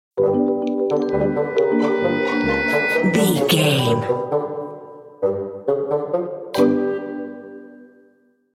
suspicious sneaking stinger

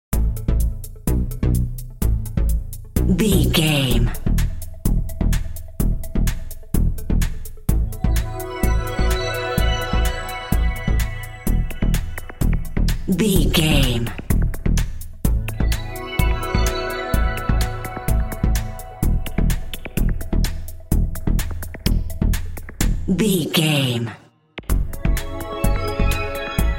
future technology bed

